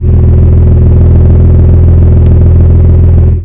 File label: car